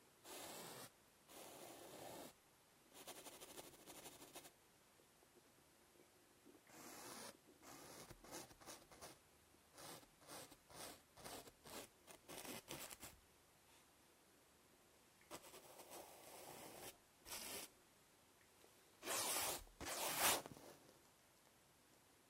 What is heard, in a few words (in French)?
croquis